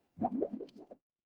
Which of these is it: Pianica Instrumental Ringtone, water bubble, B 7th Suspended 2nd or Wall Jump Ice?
water bubble